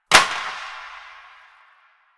Plastic Drop; Warframe; NineteenNinetyNine

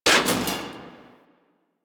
Missile Launcher Mech B; Weapons; Warframe; Railjack

